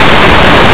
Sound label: folder